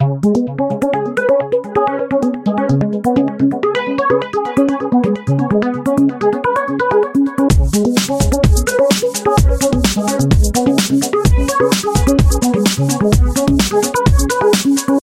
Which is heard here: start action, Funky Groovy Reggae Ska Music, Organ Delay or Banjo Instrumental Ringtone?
Organ Delay